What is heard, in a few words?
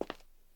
footstep; gmtk24; stairs map